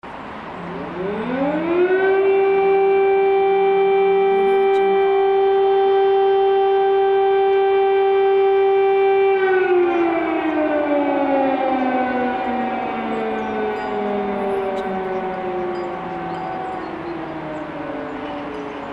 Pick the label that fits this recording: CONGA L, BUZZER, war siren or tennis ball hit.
war siren